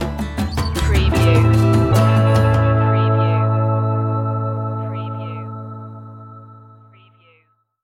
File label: rising up stinger